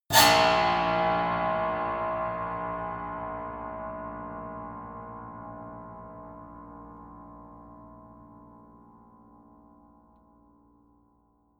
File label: piano squeal